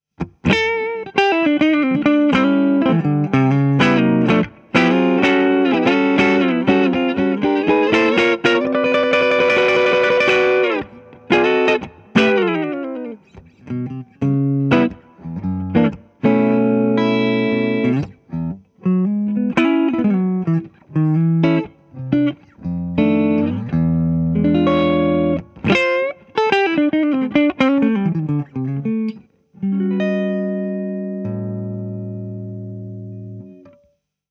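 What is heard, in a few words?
clean ES335 Mic; Bluetone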